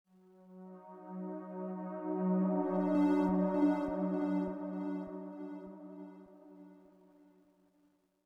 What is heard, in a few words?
Synth Pad; Plants vs. Zombies Battle for Neighborville